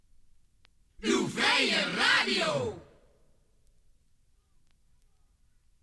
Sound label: topformat maxi jingle single